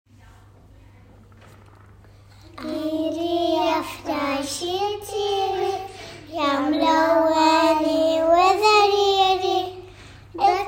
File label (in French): chanson; grand lycee franco libanais